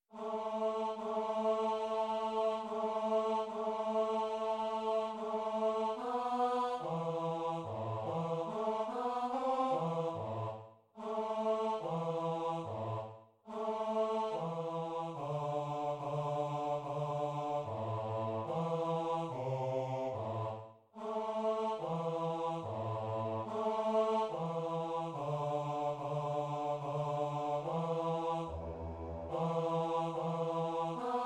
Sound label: bass; Shlof, mayn feygele